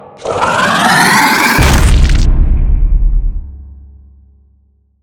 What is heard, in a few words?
death